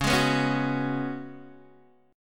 C sharp 7th Suspended 4th, C sharp, down, Guitar, DADGAD